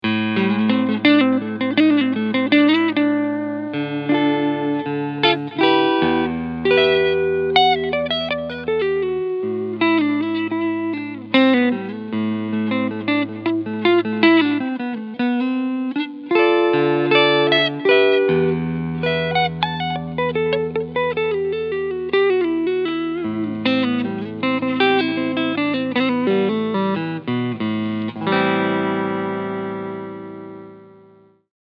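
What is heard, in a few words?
Fender Vintera 50s Telecaster Cleansound Neck Pickup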